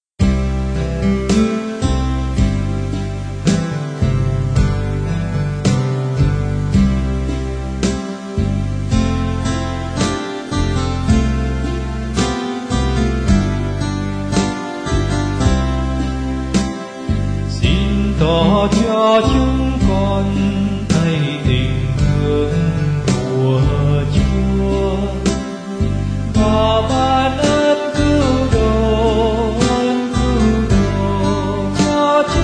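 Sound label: soprano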